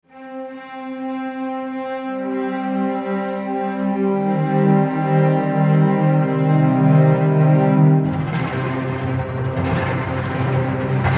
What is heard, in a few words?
Str Lo Tenuto Sul Pont; Spice